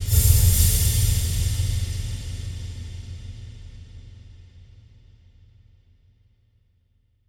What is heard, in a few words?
Huge Verb Tail, Long Reverb Tails; impact samples